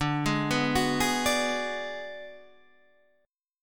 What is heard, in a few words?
D 6th Flat 5th D down Guitar Standard, chord; D6b5 arpeggiated; JGuitar